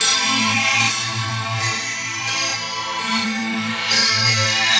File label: Sideways Guitar; Strings; Sonic Foundry (Sony Creative Software); Myths of Technology